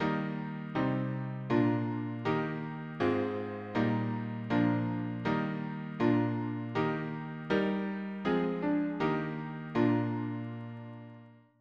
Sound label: harmony piano